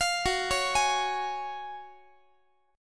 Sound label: Gb Major 7th Suspended 2nd, Guitar; GbM7sus2 arpeggiated; 4A,4E,5A,5D,5G#,6C#